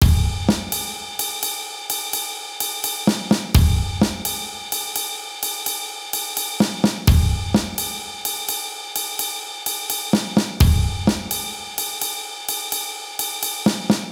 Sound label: 85 bpm; Debutant